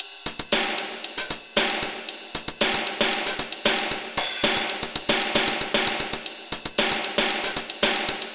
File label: Percussive, Drums & Percussion, Beat Components